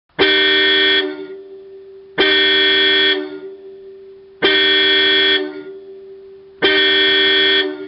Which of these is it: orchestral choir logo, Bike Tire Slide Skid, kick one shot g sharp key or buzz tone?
buzz tone